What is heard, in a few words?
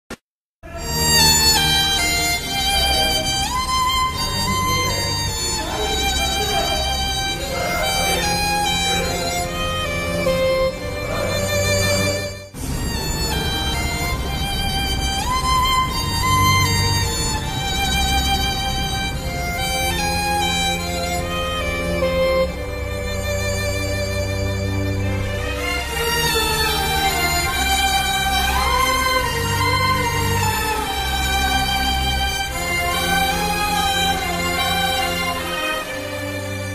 anniyan sad bgm